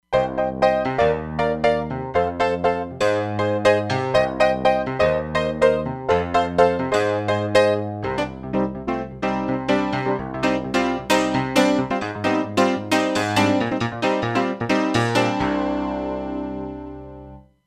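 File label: Dance Piano, Roland Fantom XR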